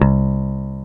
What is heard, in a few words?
D-BASS